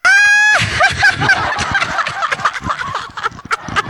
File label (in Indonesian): ketawa